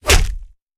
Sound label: Stab Bayonnet; Sound Ideas; Guns